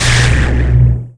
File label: RIPPLE; Messiah